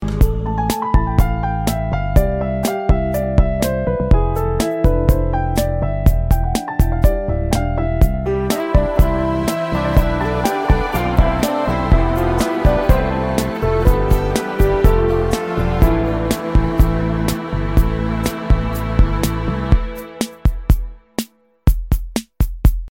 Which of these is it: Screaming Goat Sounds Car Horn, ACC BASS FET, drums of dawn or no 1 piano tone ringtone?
no 1 piano tone ringtone